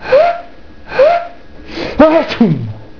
atchoum; Reperages 94; tortue

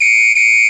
SIFFLET; MOBICLIC 13

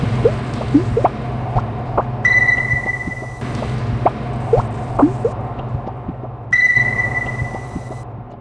MUSIK fond; MOBICLIC 61

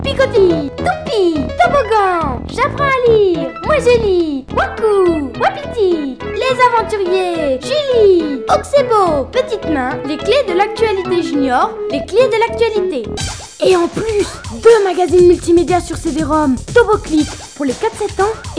attente; MOBICLIC35

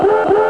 alerte panique

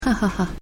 rires; Mobiclic51

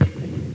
crabe; Emme; Arc En Ciel Et Le Lagon Merveilleux

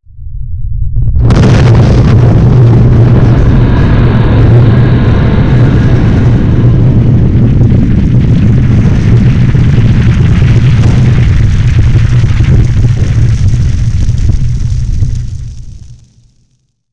explose GROS vaisseau; PC Gamer IT CD 48; Big bang

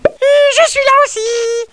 bruit; MOBICLIC37

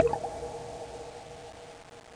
mauvaise

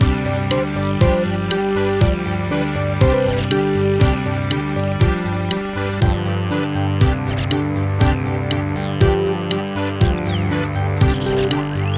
Musrap; MOBICLIC 14; europe

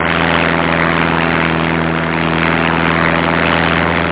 moteur avion; BaseAuckland2

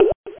goutte; Pixload-4.2

